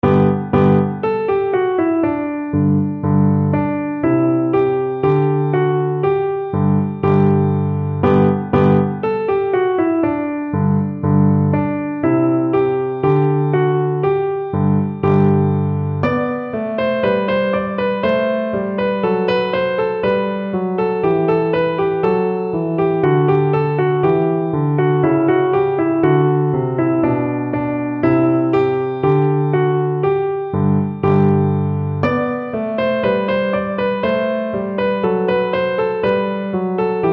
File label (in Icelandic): ding dong, píanó; jolalog fyrir piano 3 hefti